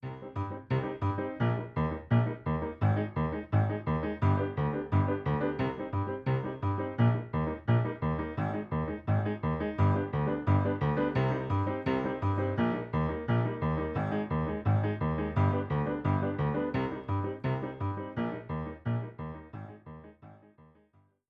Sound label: Piano; PM40T